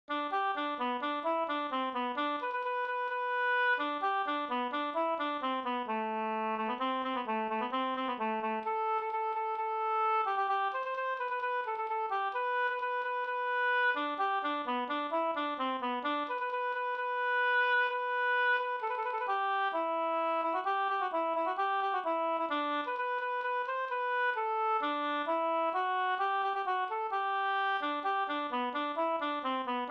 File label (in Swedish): hamborger G dur